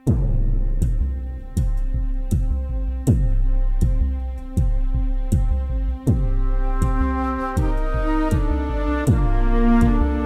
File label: quiet intense groove